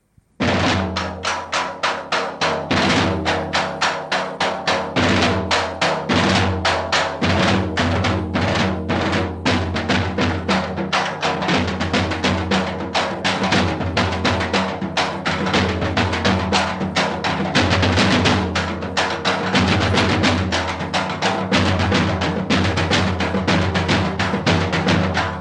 Instrumental Music; Atishbaz 1990

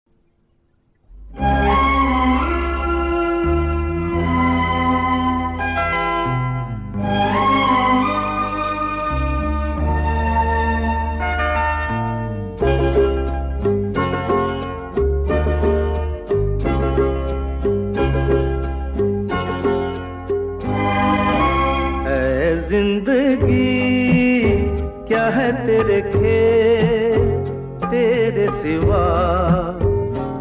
Male; Naa Mumkin 1988

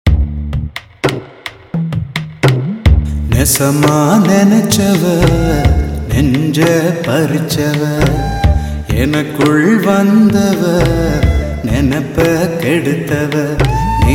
Male; Gowravargal 2010

